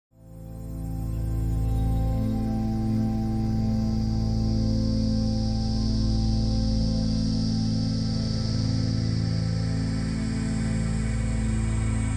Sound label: Relaxation Music with Voice Guidance; relaxing music of Peaceful Presence